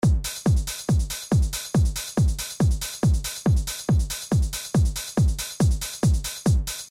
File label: mega drumming